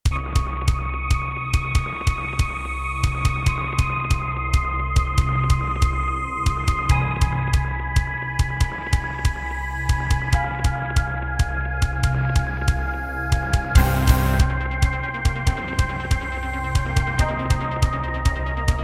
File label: Roland JV-1010